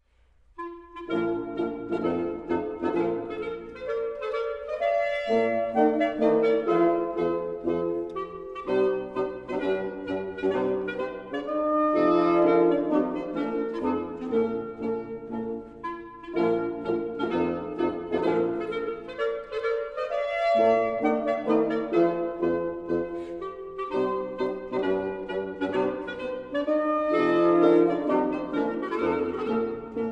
March for 2 Oboes, 2 Clarinets, 2 Bassoons & 2 Horns in Bb; Chamber; Wind octet; Beethoven; WoO 29